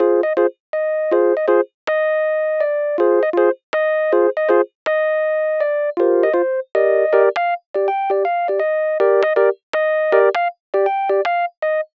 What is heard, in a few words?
Liquid EP